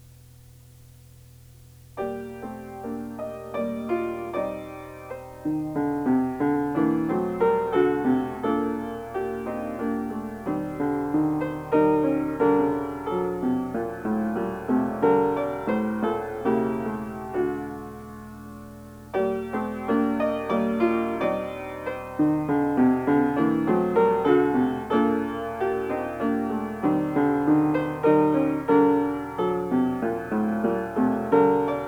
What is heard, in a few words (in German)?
Barock Klavier-Suite, Courante